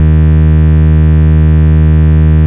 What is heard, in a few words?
Root D#, Bass